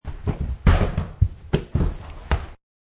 Strgl Inside, Sounds of a fight on a wooden floor.; Struggling Sounds Wood Floor 1; Wrestling and Struggling